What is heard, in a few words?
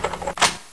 awp clip in, weapons